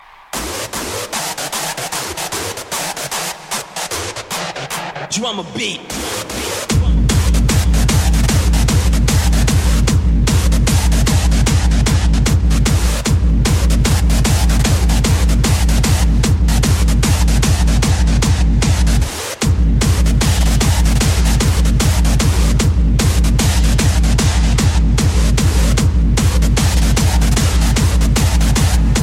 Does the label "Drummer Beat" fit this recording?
Yes